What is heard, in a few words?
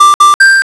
notification alarm